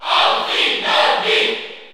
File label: Cheer French; Greninja; SSBU